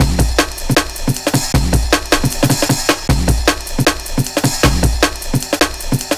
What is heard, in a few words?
breaks, Loops